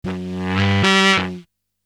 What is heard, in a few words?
Aliens Monsters; FXHome